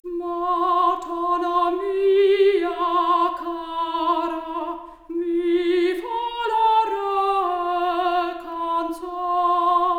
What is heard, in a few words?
Soprano Stereo output, 4bit